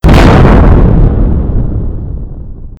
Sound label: explosion; Artemis 2.7; HamakSector